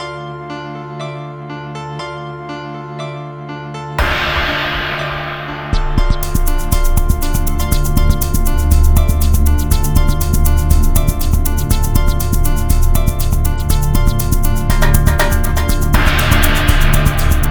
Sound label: Dramatic Cool